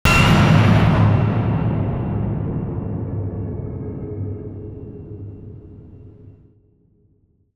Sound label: Bang Jumpscare Horror